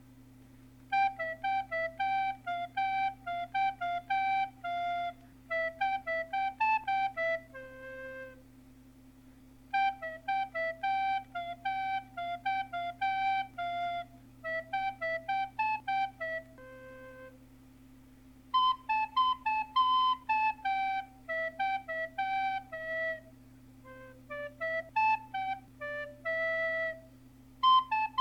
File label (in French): flute; tours d'horloge